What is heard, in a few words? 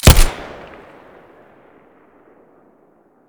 muzzle colt1911 stereo